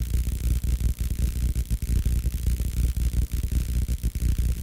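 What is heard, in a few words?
Electric Arc Bassy Loop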